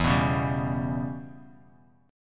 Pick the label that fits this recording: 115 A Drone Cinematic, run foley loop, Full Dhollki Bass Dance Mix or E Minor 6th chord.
E Minor 6th chord